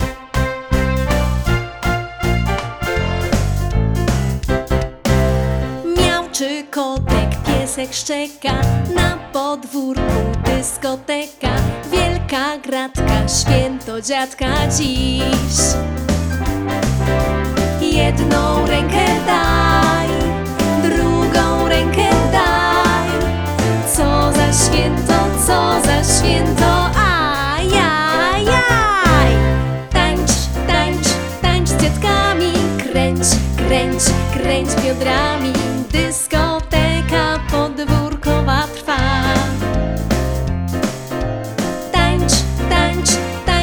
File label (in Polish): dla dziadkow wersja wokalno instrumentalna